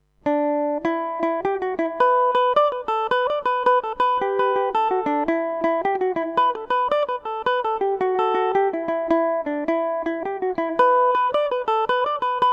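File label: E dorian revelation, Irish mandolin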